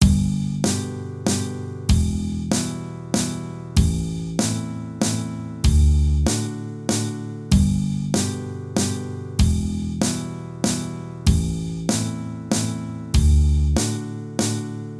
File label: Waltz